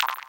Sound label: Braille Chord Clock Tick; ESAN VO Sound Schemes; ESan Eloquence VO Sounds Pack